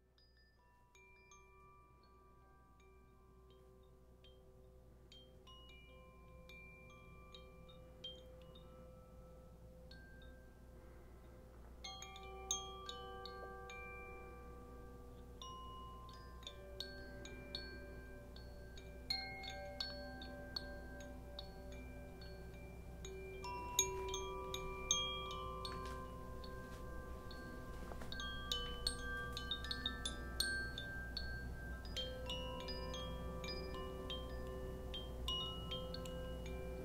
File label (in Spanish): Koshi viento